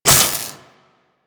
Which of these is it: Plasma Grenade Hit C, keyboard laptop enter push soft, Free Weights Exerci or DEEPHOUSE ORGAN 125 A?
Plasma Grenade Hit C